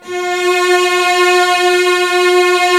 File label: STR LUSH S, MIDI Section; String Master Series; Combos 2